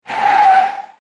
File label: car sha che